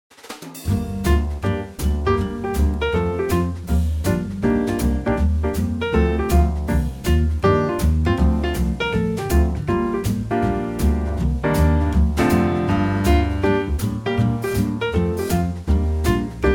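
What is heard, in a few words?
Gm, backing track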